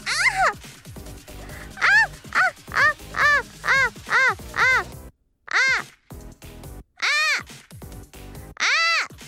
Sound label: hope noises